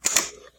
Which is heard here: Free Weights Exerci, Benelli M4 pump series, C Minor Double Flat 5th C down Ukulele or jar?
jar